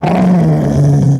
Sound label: ambient, alpha orc dog